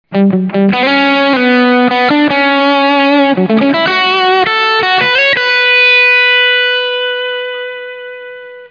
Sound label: riff